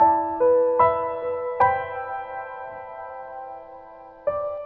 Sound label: piano, Loops Sad